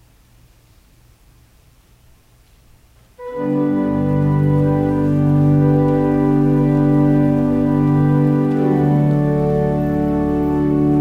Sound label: Organ Music; Swearing an Oath; Netherlands Reformed Congregation, St. Catharines, ON